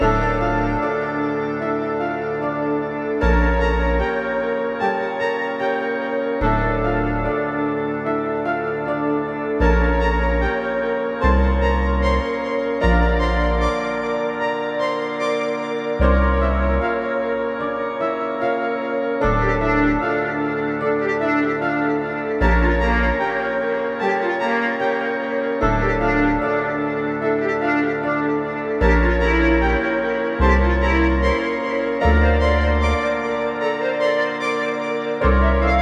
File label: Groovr Loop 150bpm; jenny leclue detectiv